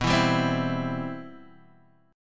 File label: C Major 7th, B, guitar chord